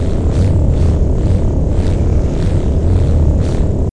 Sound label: ambient; menus ambient; SMAN